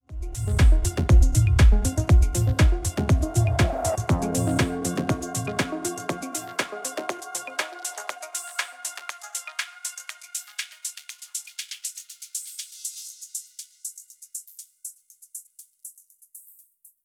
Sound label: HP 0 Reso